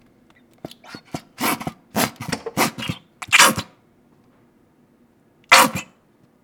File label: Squeezing Ketchup; Smith